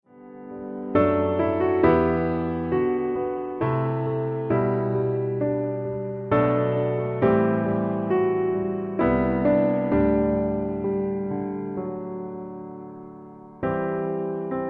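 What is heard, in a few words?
Come Thou Fount (Piano Low)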